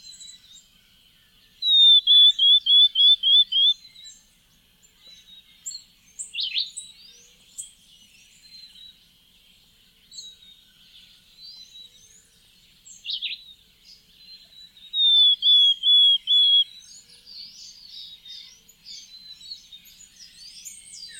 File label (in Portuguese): Canto de caneleiro